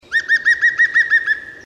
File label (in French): Pic vert